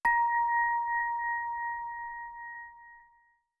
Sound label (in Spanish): campana exito